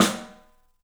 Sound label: STEEL S, drums; 3D Sound